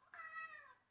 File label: cat; 猫の鳴き声「んにゃー↑」